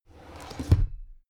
drawer cabinet crammed close regular halfway

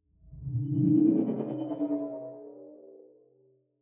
sci-fi display transmission damped

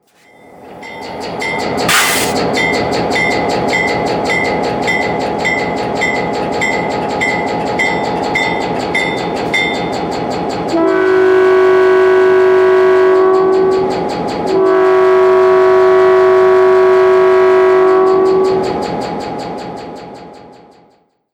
train waiting bell in usa

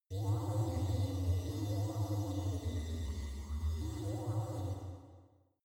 space alien fabric machine butting huge

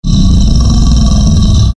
danger growl

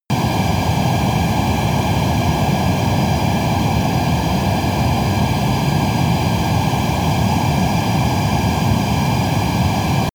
military jet fighter turbine steady soft

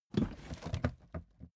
suitcase swing case open

